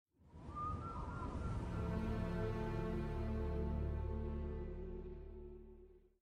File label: whistle alert; frozen